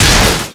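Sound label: crowbar hit body robot; melee impacts; Garry's Mod; tf2; mvm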